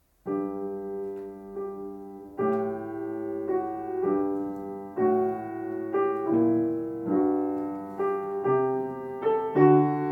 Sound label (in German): Zweistimmige Invention